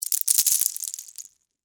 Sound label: Texture, Debris, Slow, Pasta, Shells; Debris (Sound Effects:Materials)